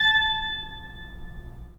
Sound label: 3D Pipe Mix Celeste, 3D Organs